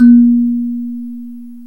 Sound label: KALIMBA, ETHNIC; AKAI S-Series CD-ROM Sound Library VOL-8